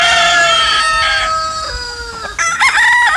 cock crow

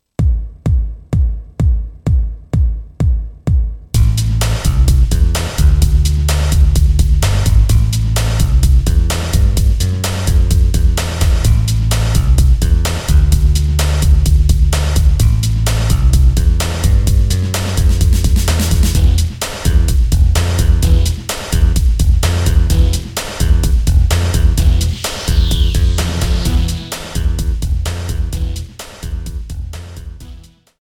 big beat acrobat sample

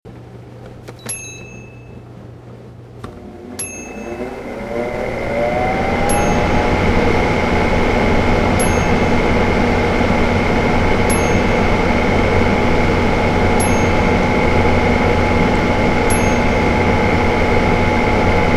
Air Conditioner

